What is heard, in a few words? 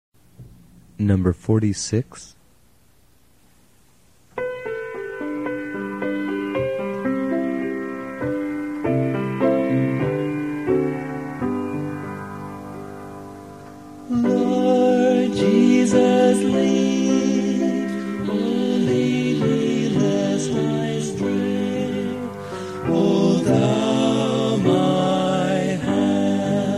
hymn; Hymns Old and New; Lord Jesus, Lead